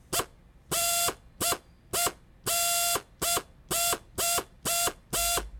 spare tire; RS Games Sounds

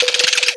Drill; Voiceover Sounds; Bop It Sounds

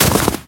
snow fall; Golden Crayon Sounds

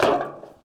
bleacher step; Golden Crayon Sounds

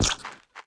Braille Chord Clock Tick; ESAN VO Sound Schemes; ESAN Horror pack

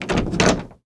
door open; Golden Crayon Sounds

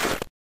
snow land; Golden Crayon Sounds

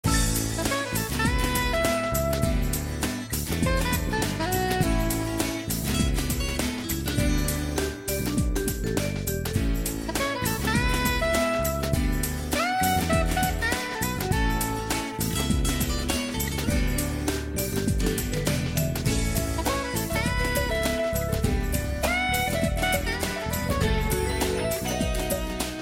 main music; RS Games Sounds; Apples to Apples

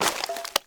debris step; Golden Crayon Sounds

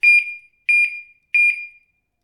alarm; Golden Crayon Sounds

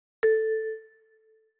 browse Mode; NVDA; NVDA Ericson Sound Theme

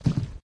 plexi step; Golden Crayon Sounds

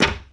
steel land; Golden Crayon Sounds

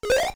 Wrap Forward; Louder; Salem's Sound Schemes; mario

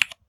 keyboard type; Golden Crayon Sounds

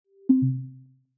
attending window end; iOS Sounds

